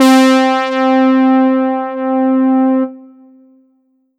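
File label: Ensoniq ZR-76 Synth Brass GM C4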